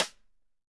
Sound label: Sidestick; Digital Sound Factory